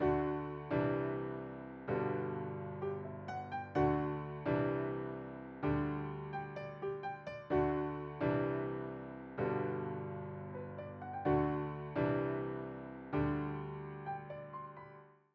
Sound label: Classic Piano, 128BPM